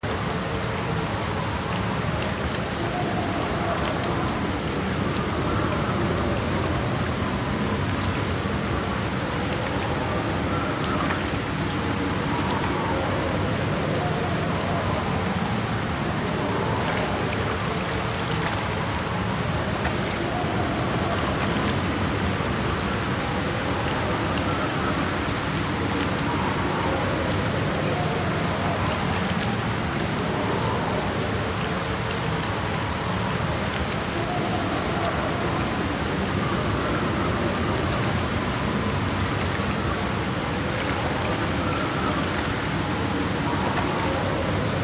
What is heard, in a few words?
water